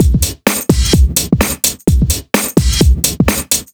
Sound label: House Beat, 128 BPM